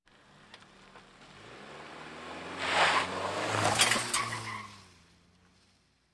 Automobiles various

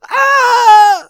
Scream; blindside

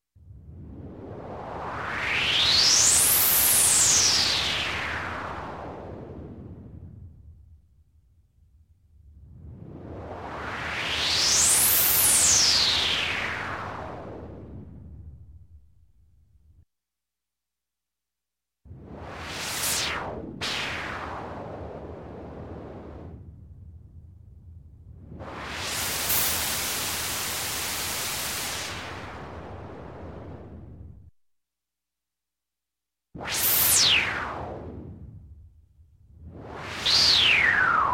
Space, Whoosh Left To Right To Left, Electronic; Sound Ideas 2000 Series

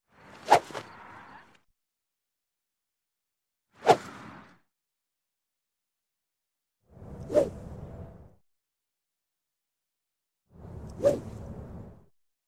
Golf Swing; Sound Ideas 2000 Series

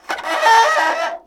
Scrape Metal On Metal; SFX Kit; Creaks, Scrapes, & Misc

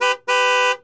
Truck Expedition Horn; Trucks

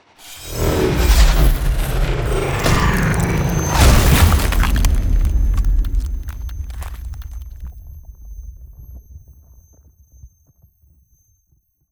Ice Spell Damage AoE Frozen Cascade; Arctic Aura

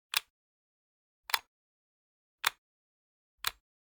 Generic Button Plastic Tiny; boom library; The Interface

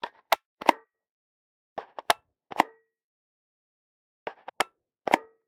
toy gun cock; weapons; NS Studios - ultra sound collection

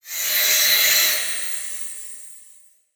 Static; Blastwave

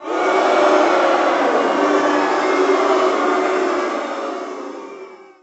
BMX Crowd Boo Small; Crowds

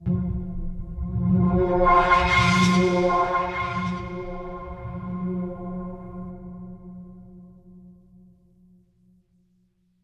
String Mystery; Inquisitor 3

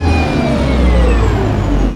Scifi Power Down Generator; Energy & Forcefields

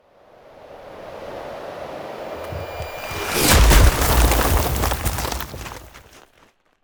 Ice Spell Damage AoE Winters Fury; Arctic Aura

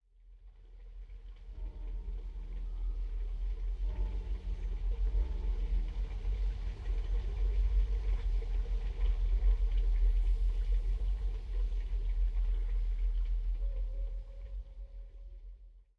Pump Ambi; Pitch Black Audio Pong

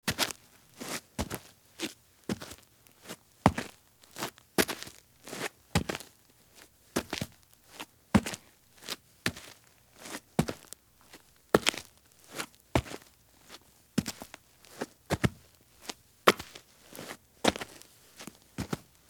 Men's Business Shoes Walk at Slow Pace on Dirt; Sound Ideas; Footstep Sound Effects

